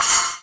splash cymbal; play drum

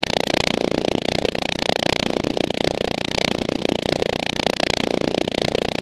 propeller; Bad Piggies

